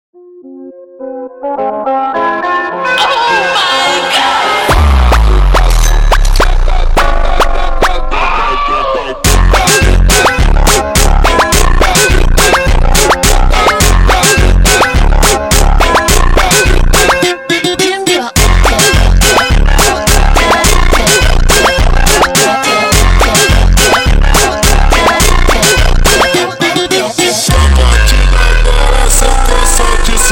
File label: Montagem super Slowed